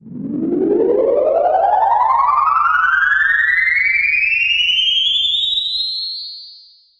Sparkle Rise

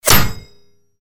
bullet impact metal heavy; gamerip; Out of Reach: Treasure Royale

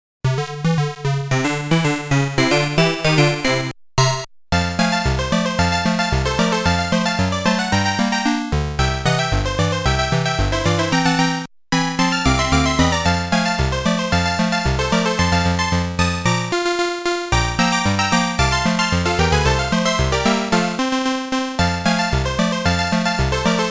Round Start - In-Game Music

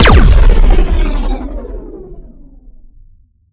Shot Large Laser Electron Cannon

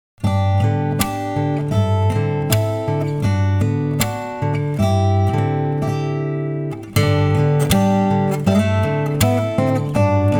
Acoustic

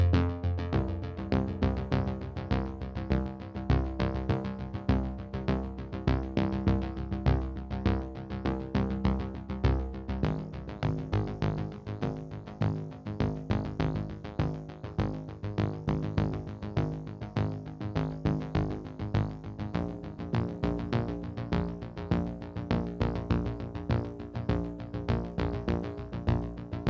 Tension Bass; nova 111; ps vita

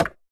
Generic Button

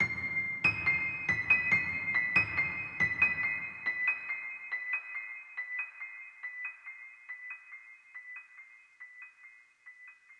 One shot Piano; Zombie Army 4 Dead War

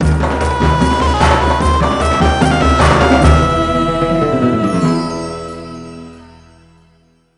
Middle East (Puzzle Solved); pandora's box

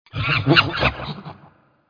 boss chimps; boss mono

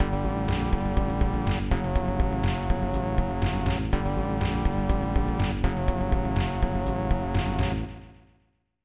Unused Jingle; batman revenge of the joker; snes

